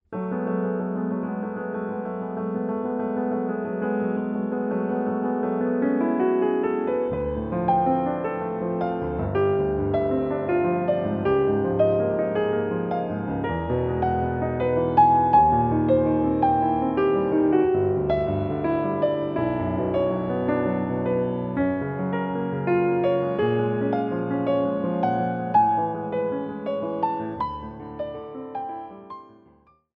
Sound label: notturno